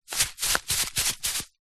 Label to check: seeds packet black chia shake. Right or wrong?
Right